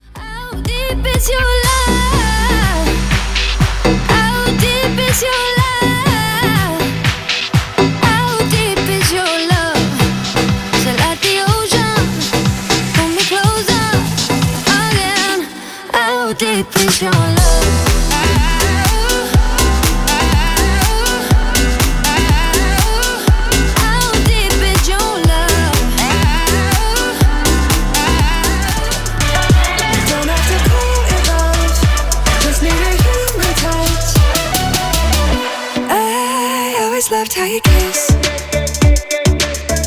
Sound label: EDM sampler